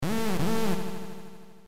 alarm; ff3; ff3us

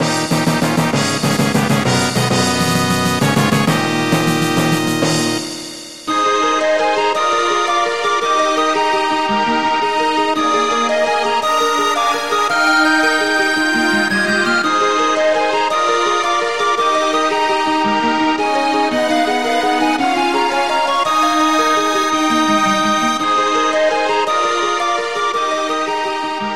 fanfare

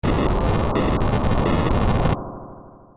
exploder; ff3us

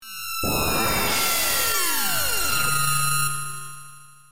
laser atk; sfx; ff3; ff3us

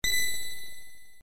ting; ff3; ff3us

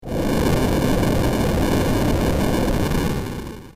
inferno; ff3us

